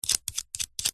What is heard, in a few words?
industrial small nut turn tighten on metal bolt x4 turns